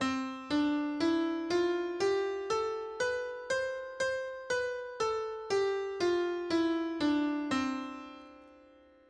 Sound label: C major piano scale